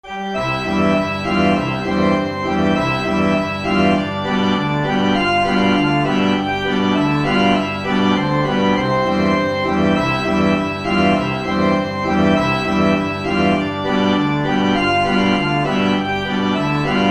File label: accordion